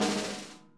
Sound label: SNARE, Interactive Drum Kits